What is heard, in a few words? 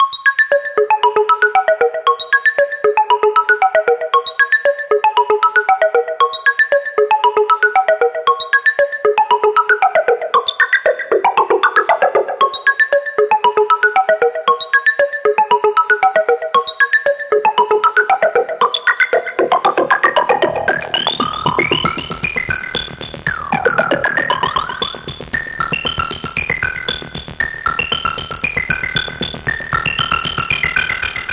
alarm